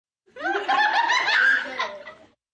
Group Short Laugh, Laugh, Group, 5-8 children, short, outburst, kids; girls, boys, human voice; VoiceBox